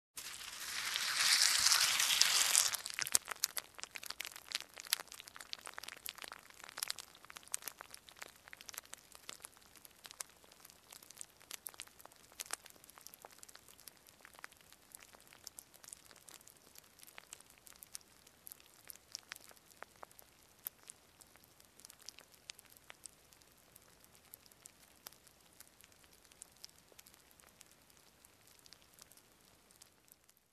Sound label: Filling up with milk